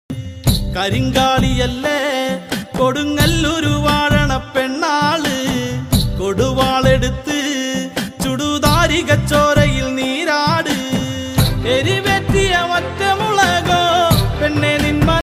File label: nadanpattukal malayalam album songs karinkaliyalle song